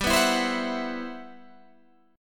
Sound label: C Major Sharp 11th G